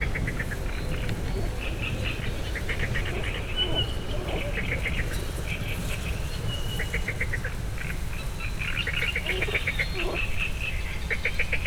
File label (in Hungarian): szeles idő, kecskebéka; szegedi zoo 2011